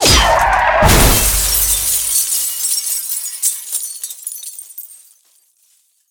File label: crash shot; Blind Drive